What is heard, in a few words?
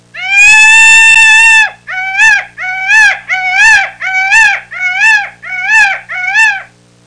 Meadowland bird